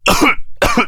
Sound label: COUGH MALE; combatarms